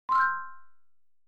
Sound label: Wink